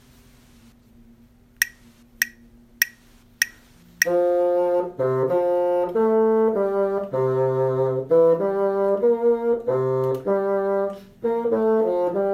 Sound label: bassoon